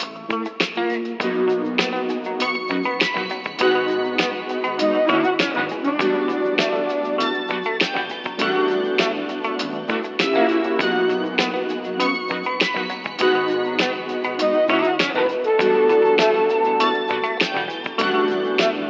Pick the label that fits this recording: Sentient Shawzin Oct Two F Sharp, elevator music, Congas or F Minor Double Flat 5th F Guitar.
elevator music